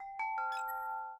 Unlock, phone ui; Micromax; Superfone Punk A45

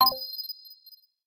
stop rec, camera; Sony Ericsson Xperia X10

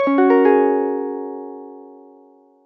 SMS ringtone, notifications; Rage 35GN

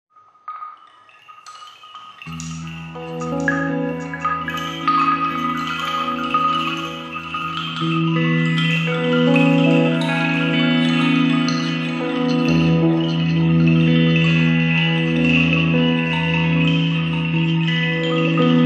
Bamboo Dream, ringtones; Windows Mobile

